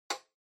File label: Metronome High Click Single